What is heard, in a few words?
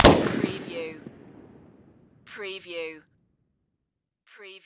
Machine Gun M16